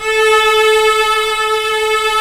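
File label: cello E; AKAI S-Series CD-ROM Sound Library VOL-2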